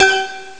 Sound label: disc pling moll; ST-XX Amiga Soundtracker Sample Packs